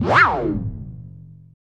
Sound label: boing; Spectrasonic Distorted Reality 2; BEMUSED